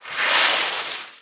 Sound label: slope; hereticiidemo; player